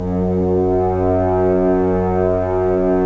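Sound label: Solo French Horn, Brass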